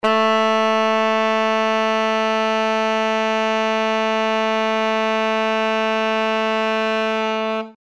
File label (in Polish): Gb1, saksofon; aplikatura; in Eb